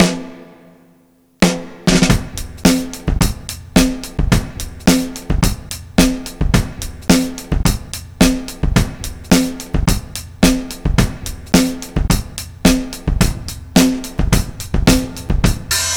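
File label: drum 108, 108bpm, Breakbeat; Best Service ProSamples vol.40; The Lost Beat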